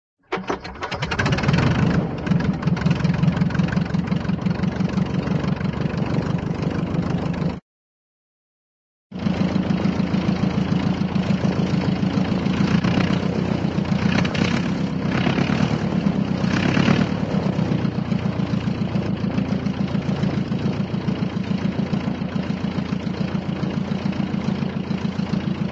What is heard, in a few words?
airplane, prop bleriot start; cartoon